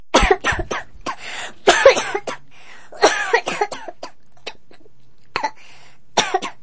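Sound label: child's cough strong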